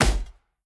armor impact; smite; lancelot

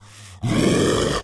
zombie brute run; smite; npc; roman archer

